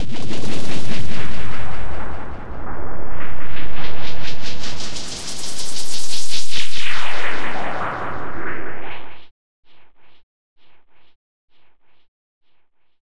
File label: Rhodes FX; EZRollers